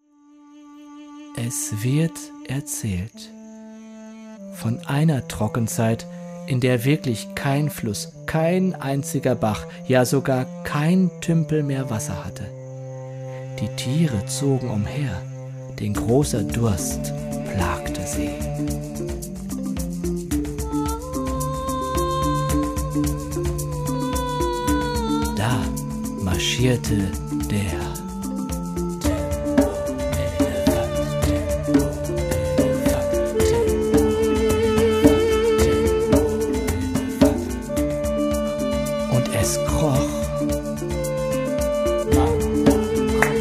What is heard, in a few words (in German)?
Trommelnde Affe